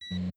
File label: incoming ai message beep; Stellaris